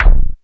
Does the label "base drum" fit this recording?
Yes